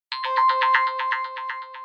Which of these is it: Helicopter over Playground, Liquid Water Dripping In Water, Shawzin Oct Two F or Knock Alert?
Knock Alert